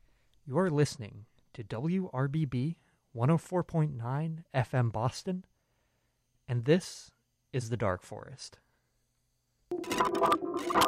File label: glitchgaze